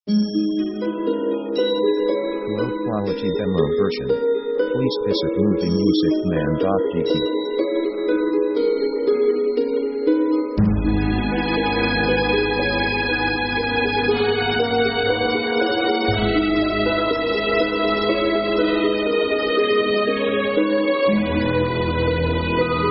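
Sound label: orchestra adventure harp; contemplative themes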